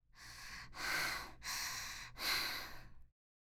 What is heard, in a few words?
Girl Exhaling